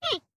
熊猫待机声

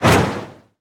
旋风人射击声

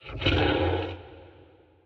骷髅马水中嘶鸣声

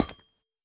铜踩踏声

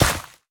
可疑的沙砾踩踏声

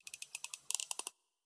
海豚水中待机声

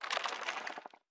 鹦鹉模仿嘎枝声

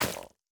根 破坏声

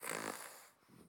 狐狸睡觉声

